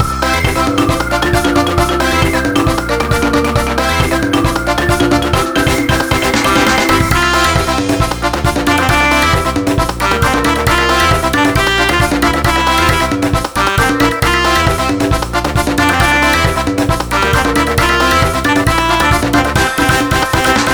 BGM mono; wicked monsters blast hd; wii u

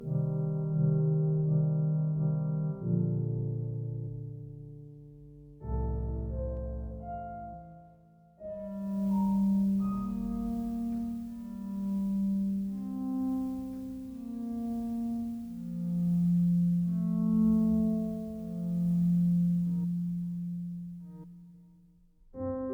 Slow

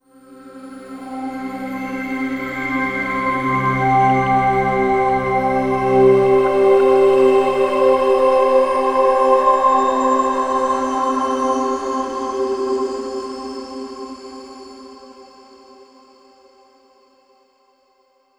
Overlay Drone

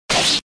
Barbarian Attack Hit; gamerip; Mighty Knight 2 Online

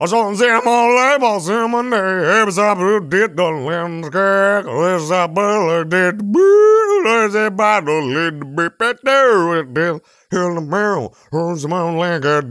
Singing; BadElvis

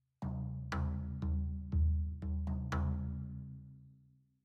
Drums; Frozen Flame; Citadel Music Drums

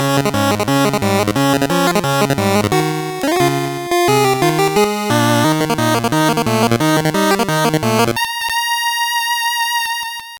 Ditty; NBA in the Zone 1999; gbc

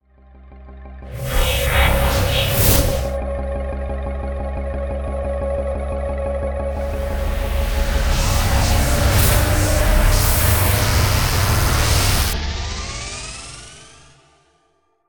stage ending sfx

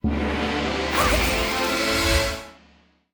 Menu Screen Victory; Jade P1; unruly heroes

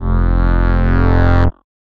outro; zombotol

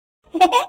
upgrade Celebration; Talking Tom Gold Run

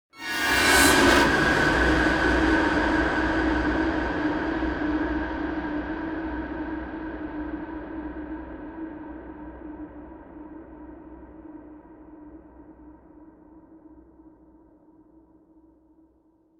Stinger Lurker; the walking dead survival instinct

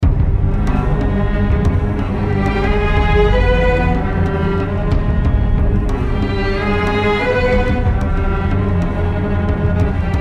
Requiem Destruction

